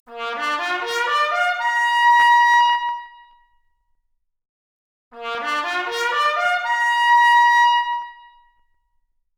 Trumpet arp, Stereo output noised; 3bit

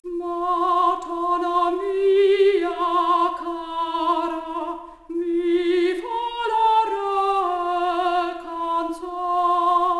Soprano Stereo output noised, 3bit

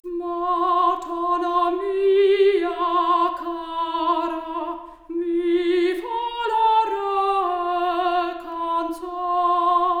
Soprano Stereo output noised; 3bit

